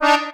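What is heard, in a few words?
Accordion Missed note; the princess and the frog; Swamp; Music Ray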